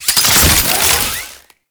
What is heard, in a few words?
Soldier Zipline Start; Enemies; Gameplay; Warframe; Lasrian Soldiers